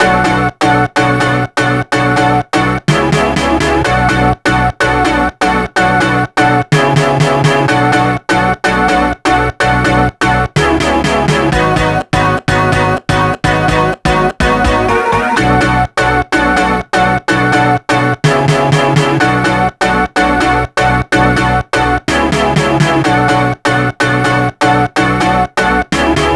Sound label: DRY MUSIC LOOP F M (125 BPM)